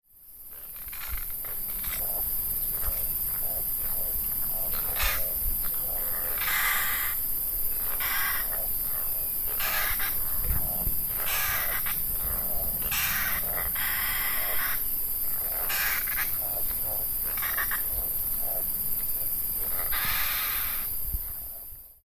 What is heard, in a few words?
Selenidera maculirostris Araçari poca